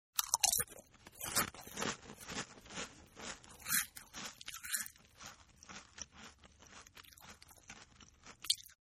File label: Crunch n Chew, Eating; Sound Effects:Human; Partners In Rhyme